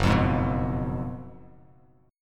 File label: F sharp Major 7th Suspended 2nd Suspended 4th, Bass Standard; strummed; JGuitar